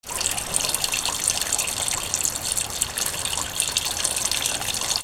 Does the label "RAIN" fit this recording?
Yes